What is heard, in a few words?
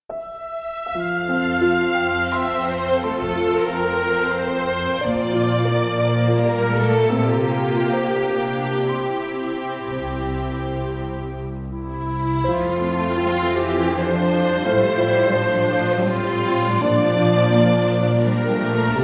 Sweeping